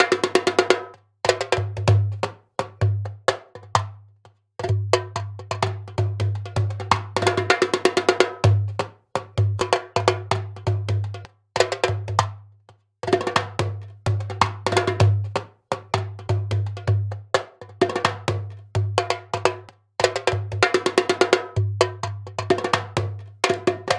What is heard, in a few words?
African rhythm; alea